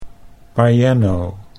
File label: piano